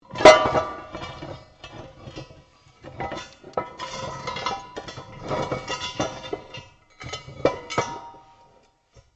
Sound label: METAL ON METAL LARGE MOVE; Materials